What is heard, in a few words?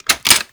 Clip Insert, Rifles; Snipetron